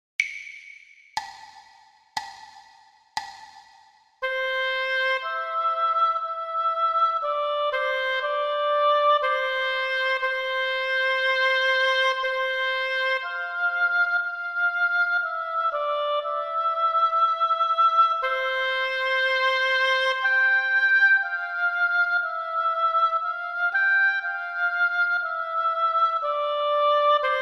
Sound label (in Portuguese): percepcao ditado